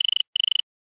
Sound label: ring; iphone